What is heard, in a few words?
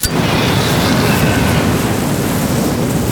teargassprayer start; tf2classic